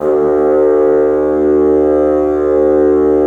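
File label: WND BN, wind bassoon; LA Composer vol. 3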